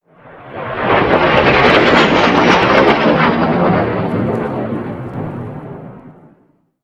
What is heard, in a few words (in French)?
avion